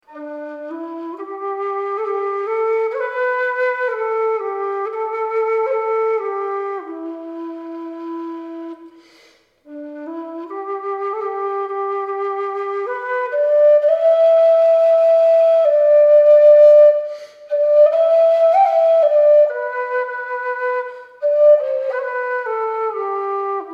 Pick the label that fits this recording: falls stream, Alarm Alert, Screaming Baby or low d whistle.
low d whistle